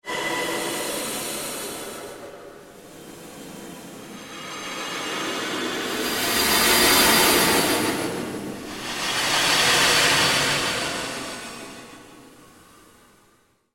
Created Fluctuation Fade, Rise And Dive Sound Effects; Sound effects created by manipulating other sounds; Rises and Dives